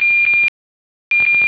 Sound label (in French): signal phasage